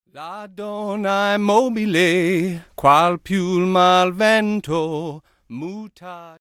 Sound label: Gondolier song; Nancy Drew: The Phantom of Venice